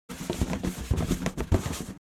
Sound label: Rubber balloon friction handling